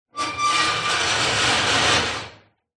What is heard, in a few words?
metal heavy industrial scrapes; zapsplat